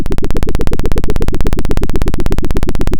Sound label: pop, Sound of a helicopter